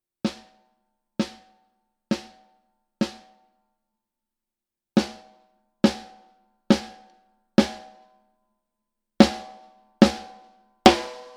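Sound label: Gretsch Black Alu mid, snare; Anniversery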